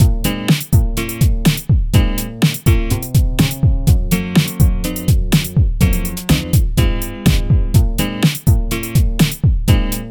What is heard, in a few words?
Pop Music 3d Loop